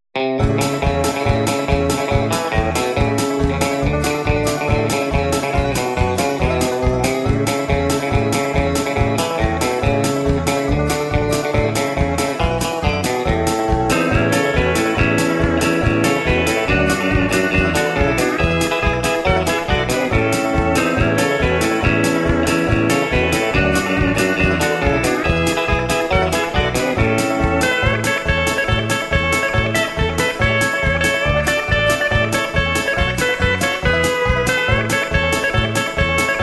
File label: jingle bells